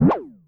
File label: Speedy, cartoon; Classic SFX; musicradar; cartoon caper